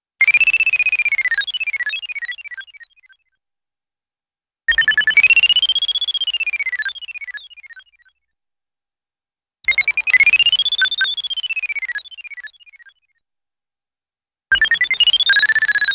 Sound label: Various Pitched Short Beep Clusters Computer Blips; Hollywood Edge Cartoon Trax